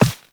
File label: Operator Walk Default, Footsteps; Warframe